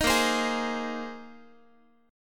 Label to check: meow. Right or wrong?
Wrong